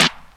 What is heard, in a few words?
snare